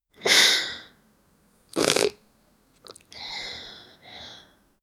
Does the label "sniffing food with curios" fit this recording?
Yes